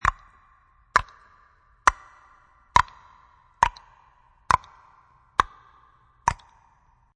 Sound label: Human Clock, Echo clock ticking sound created by using human tongue clicking sound; Loop; Miscellaneous Human Sound Effects